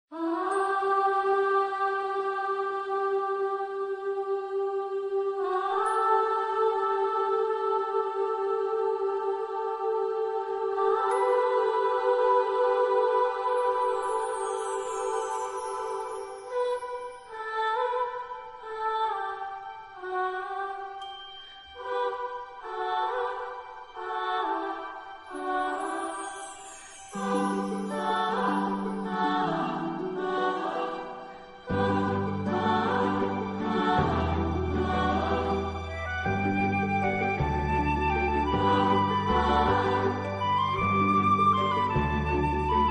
Instrumental Version; Barbie and the 12 Dancing Princesses

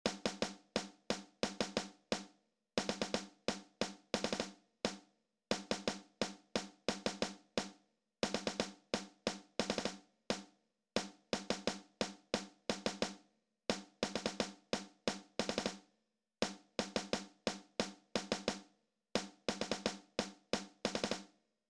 For Snare Drums Only

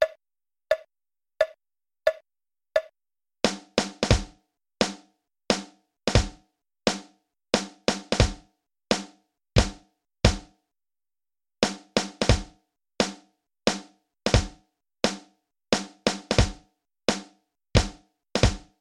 Lullaby, drums

